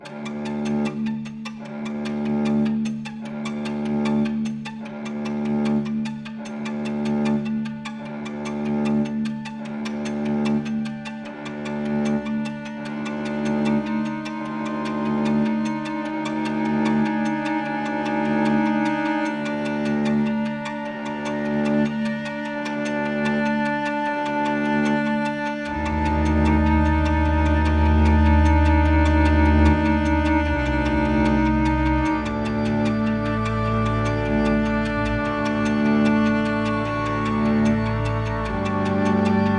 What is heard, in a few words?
Horror; Horror Vol 4 Music Pack